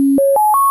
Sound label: startup